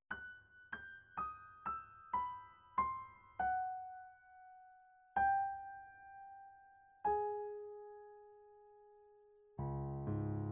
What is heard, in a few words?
Piano Solo; Halloween